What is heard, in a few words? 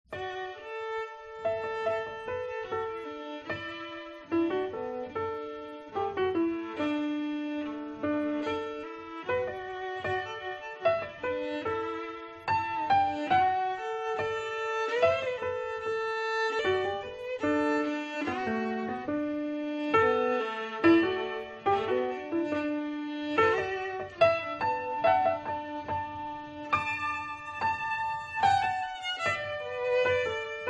Violin and Piano